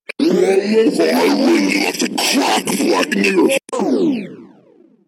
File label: delightful sound